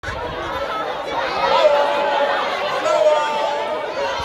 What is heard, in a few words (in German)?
Volksmusik